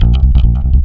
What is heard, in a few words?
LOW BASS; LOOPBASS KIT; AKAI; Big Beat Samples & More